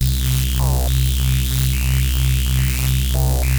BUZZ, MOOG; OLD SYNTHS; Rubber Chicken Software Sample Bank

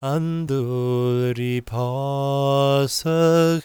GAELIC, World Voices; ILIO; Vocal Planet

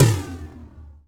TOM MID; E-MU Formula 4000 Series Vol. 2; OrbitPercMaster

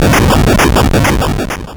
Game Boy FX Noise, chiptune; Nintendo Game Boy

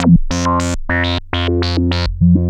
F2, VCF; CMP - Analogon; SH-VCF

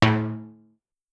Timbale, Clavia DDrum AT, Pitched Down

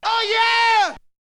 Vocal; ZERO G - Datafile DISC 1

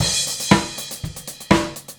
Straight Kit, World Pop Beats

